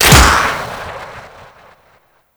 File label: Dart Pistol Shot; Warframe; Weapons; Infested